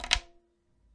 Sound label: arabic perc, Arabic Percussion Kit